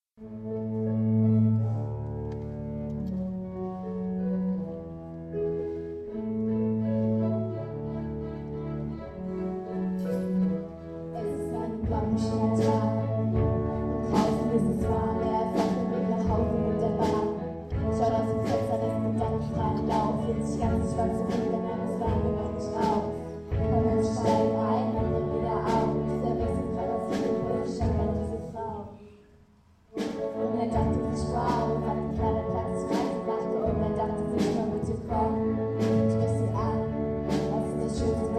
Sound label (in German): grosser Chor; Albertus Players